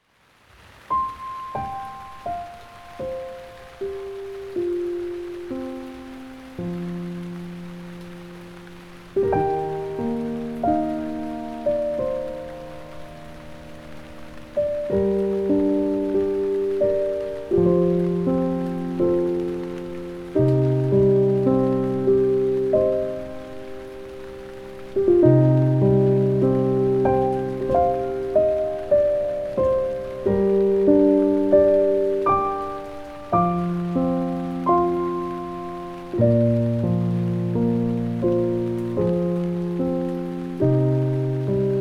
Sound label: Raindrops (With Rain), piano